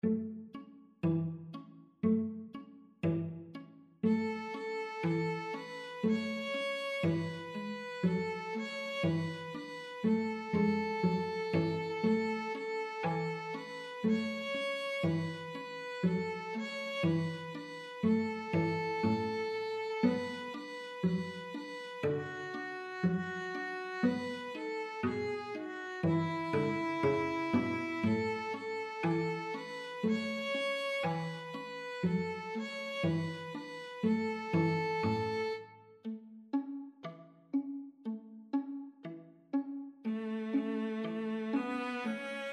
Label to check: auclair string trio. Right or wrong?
Right